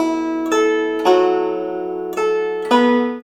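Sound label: guzheng; Spectrasonics; Heart of Asia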